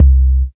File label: DM BAS, Dance Mega Synth